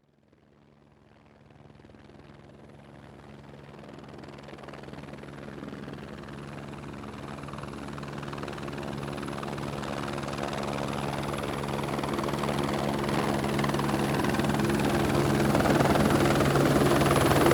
Helicopter Approach Hover Fly Away; A helicopter comes in, hovers for a while, and flies away; Helicopter Sound Effects

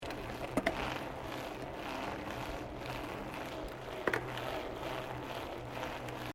Bicycle Mountain Bike Road Gear Change

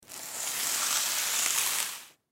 Rope Pull, Foley Rope Pull Slow Single; Rodeos

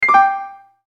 CHIME PIANO, Chime Piano High Descend; Chime Piano High Descend Production Element Imaging Element Accent Transition; Multimedia; Blastwave

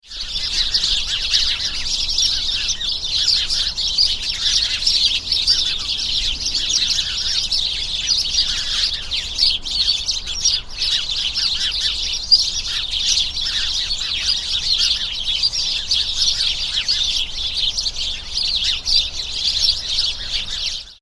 Birds Starlings - Many Starlings roosting in bush; Animals

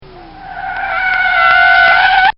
Volvo Screeching By; Cars; Vehicles; Bobby Cole

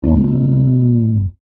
Dinosaur Groan, Creature

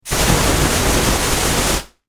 Warfare Flame Thrower Burst; Miscellaneous Combat

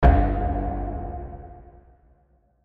Hit Low Bright Filter; Production Element Imaging Element Accent Transition; Bass Buttons; Blastwave

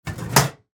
Fountain Drink, Soda, Machine, Dispense, Short; Soda Guns; BlastWave

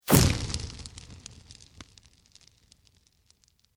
Sweep Organic Fast; Created sweeps, swooshes and other movement effects; Sweepers; Sound Design; SoundEffects-Foundation4

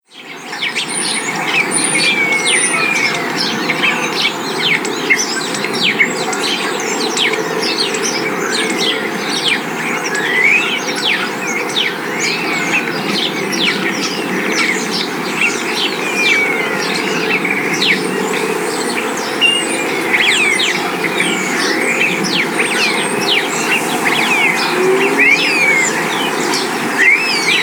Bird Song In Spring Coastal Town Ambience; Bird Sound Effects; Outside Ambience Sound Effect

